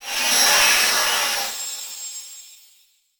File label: Title Transition Feedback Blast; Production Element